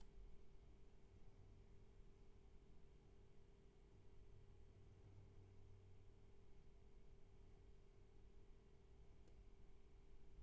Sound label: roomtone